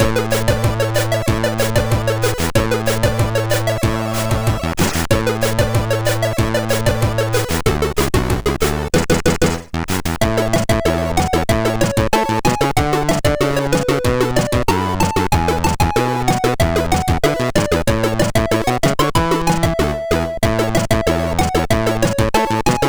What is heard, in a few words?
Slimbo4, MOS6581R3; sid